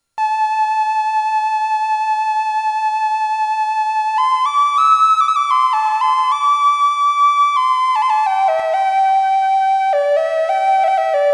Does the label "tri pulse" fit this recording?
Yes